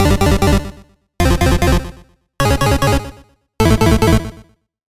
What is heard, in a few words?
SFX - 8 Bit Tunnel; Routed Sound; Serum 2 Expansion - Hyper Gamer Vol.1